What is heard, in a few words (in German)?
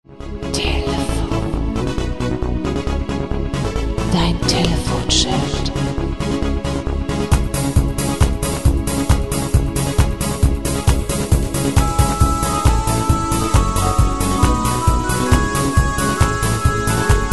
Telefon Ring